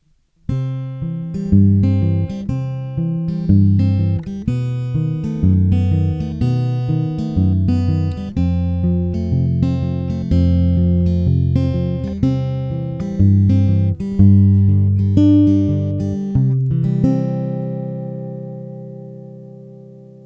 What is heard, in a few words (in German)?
Harley Benton TravelMate E Pickup Picking Volume voll auf